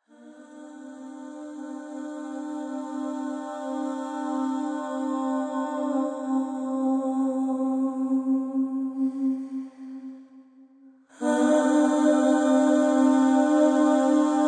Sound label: Interval toning C D